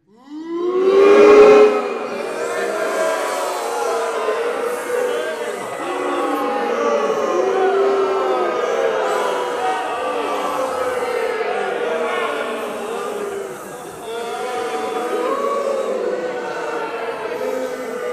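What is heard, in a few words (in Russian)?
негодование мужское мало людей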